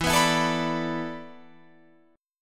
E 7th Suspended 4th E down Guitar Open F; E7sus4; strummed

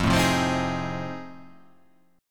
F 7th Sharp 9th

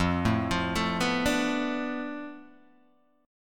F 6th Flat 5th F down Guitar DADGAD, chord

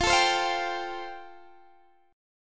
F Major Add 9th Guitar DADGAD; down; chord shape

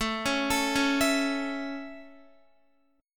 A Major, Guitar; arpeggiated; JGuitar

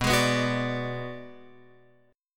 B Minor 6th B, Guitar Open F; Bm6 strummed; x,6,8,6,9,9; JGuitar

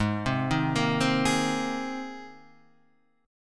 Ab Major Add 9th Ab Guitar Half Step Down

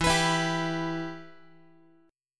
E-Minor, Guitar; strummed; JGuitar

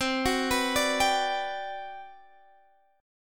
G Major 7th, C; Gmaj7/C; arpeggiated

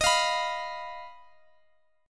Eb Suspended 2nd Sharp 5th, Guitar, Open D

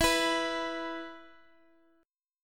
E 5th E down Guitar Half Step Down

